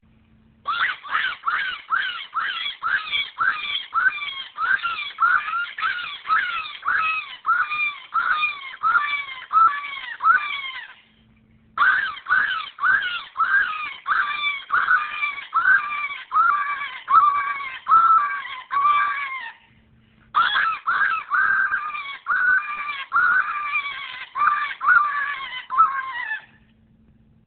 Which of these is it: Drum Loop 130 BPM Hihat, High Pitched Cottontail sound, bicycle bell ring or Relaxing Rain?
High Pitched Cottontail sound